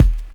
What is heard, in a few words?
kick